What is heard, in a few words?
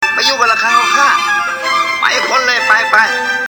cartoon